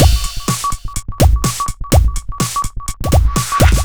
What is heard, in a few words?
Full, Drum Loops 125bpm, Nu Dance Electro; Zero-G; Soundclash Nu Dance Electro; Acidized Wavs; Semi-Skimmed